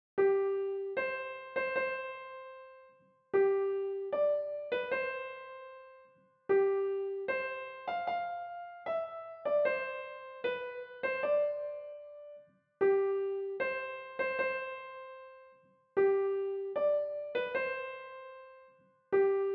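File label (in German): Hochzeitsmarsch Klavier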